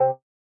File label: point